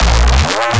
bass hit reach A#; Virtual Riot

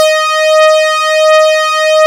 LEAD, Nord; Wizoo - Powered Nord; LEAD PAD HRN

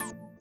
VIBRA; Spectrasonics; Metamorphosis

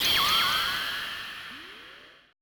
AMBI FX

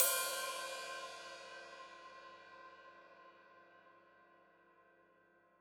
Hat Open, Hi-Hat; Deeply Sampled Drums

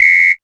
technox perc; Quasimidi Technox

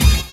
DANS STAB; E-MU Formula 4000 Series Vol. 1; Hip Hop Nation; Raw Phatt

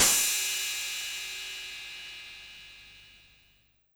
808 Cr; Roland MV8800; Dirt&GrimeKit

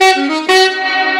Sax Effect; Effected; Sonic Foundry (Sony Creative Software); Jazz Solos and Sections

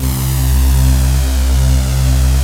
PWM PADS; Pro-Rec - Synthsonic; TR PADS

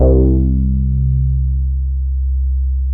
bass, 162 BPM, Drum & Bass; Ueberschall; Drum & Bass Resonance

